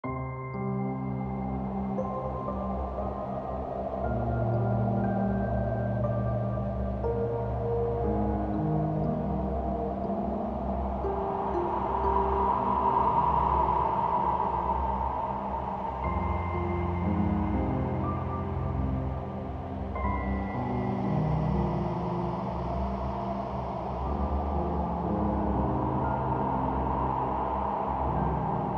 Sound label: Enervating Atmosphere; Terraria Spirit Mod